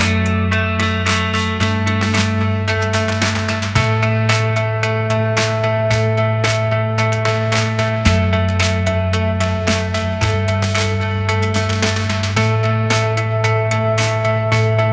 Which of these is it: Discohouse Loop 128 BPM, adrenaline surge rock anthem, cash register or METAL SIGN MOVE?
adrenaline surge rock anthem